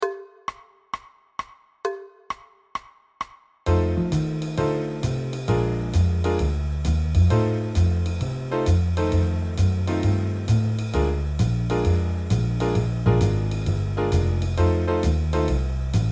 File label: blues playalong 132bpm